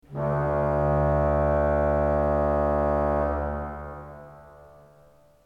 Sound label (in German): ton, orgel